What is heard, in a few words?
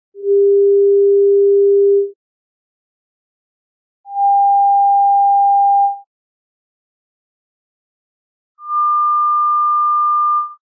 steady